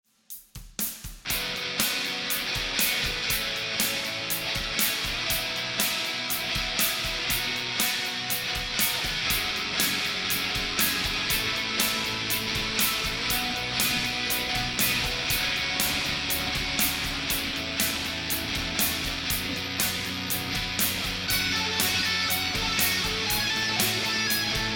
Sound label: ROCK RIFF Residual Noise